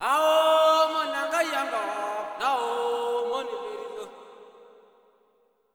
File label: SOLO G#, MALE CALLS; Spectrasonics; Heart of Africa 2